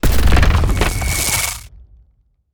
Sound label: skill spirit explo